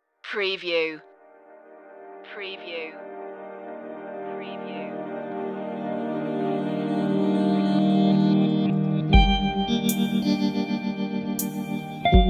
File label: spooky guitar pick